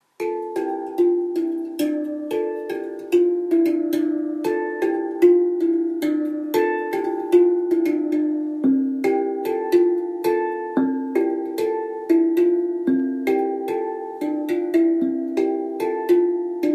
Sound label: HandPan